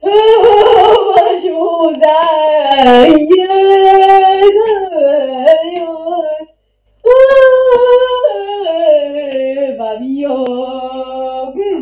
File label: walk shark